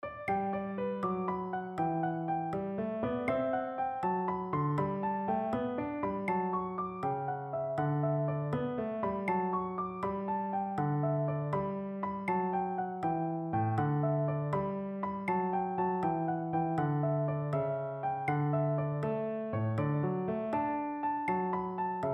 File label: audio click